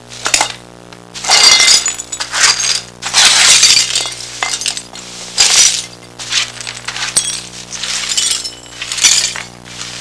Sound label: SWEEPING; HAUSHALT